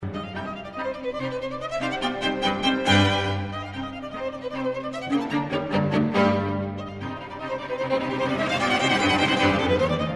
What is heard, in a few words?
beethoven string quartet c minor; op18 no4